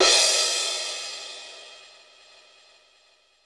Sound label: DRY CY CR, drum; Crypton - DrumBase5000; DRY KITS